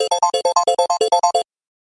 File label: samsung rush